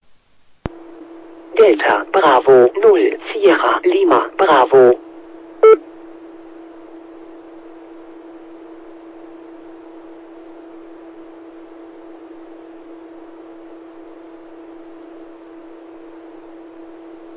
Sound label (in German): Audimittschnitt der beginnenden Funktionsstoerung; Relaisfunkstellenverbund DB0SLB